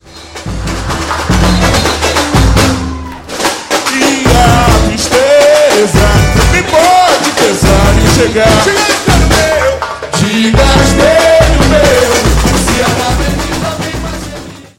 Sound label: break, rhythms, bateria; Later break